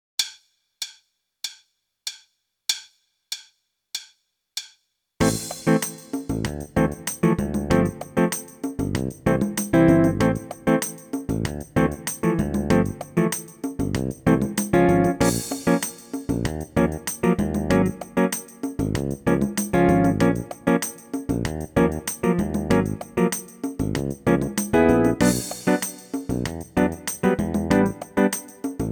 Open HiHat; Meespeel CD

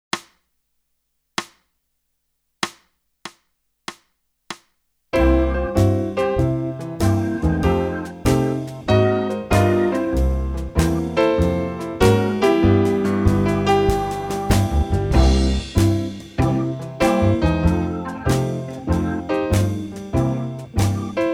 blues

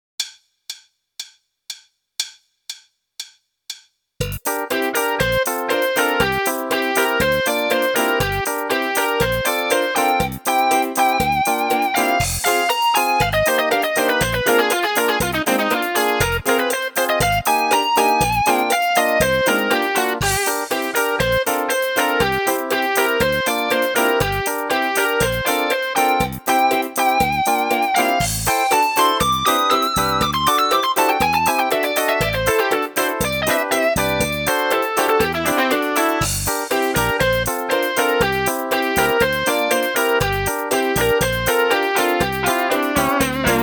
Tom Tom Beat; methode voor drumset; Meespeel CD

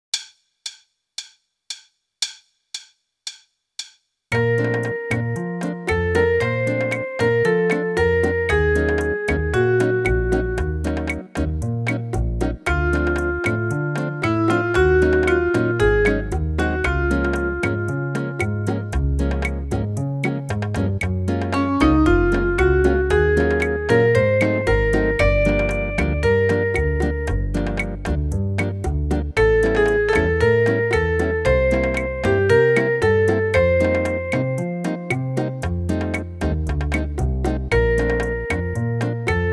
Rhumba; Meespeel CD

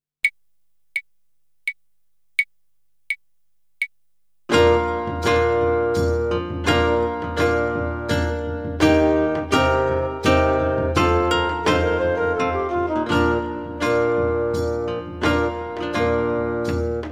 Hornpipe

